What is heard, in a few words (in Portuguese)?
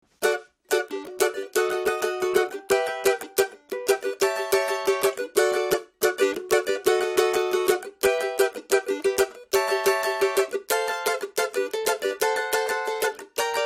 cavaquinho